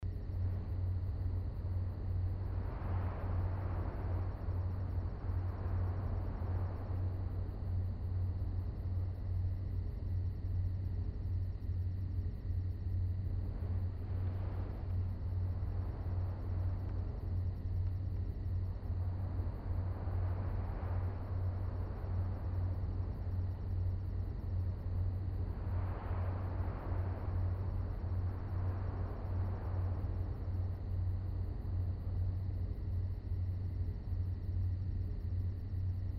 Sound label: Alley Ambience